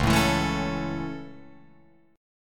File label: Db Minor Sharp 5th Db Guitar Open F; strummed; Dbm#5; JGuitar